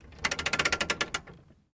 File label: download Sound, Chevrolet Corvair, Interior Pov, Set Parking Brake Slow.